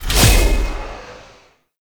Impale Cast Release; Warframe; Hoplite

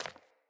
Sandals Run Default, Footsteps; Player

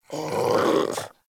Puppy Sleep Idle Snore; Vocals; Warframe; Kubrow

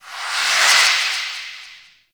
Minigame In Range; Birth Minigame; Warframe; Jade Shadows

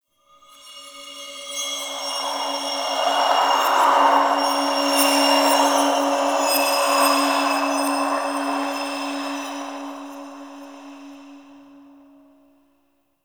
Interior Cave Spirit Gust; Ambience; Warframe; Duviri

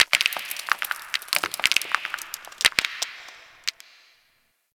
Ocean Rock Crack Small; Warframe; Grineer Ocean

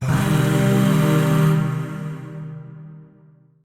Shawzin Blues Chord; Warframe; Zariman; Void Shawzin; Chords

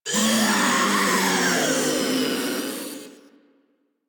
Proto Infested Ancient Death, Vocals

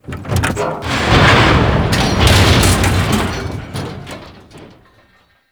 Lift Pod; Cinematics; Grineer Pod Check

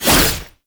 Melee Whoosh; Warframe; Oraxia; Abilities

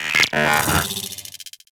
Amalgam Moa Vocal Idle

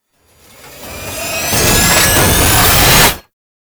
Tank Cannon Charge Up; Enemies; Weapons; Lasrian Tank; Warframe

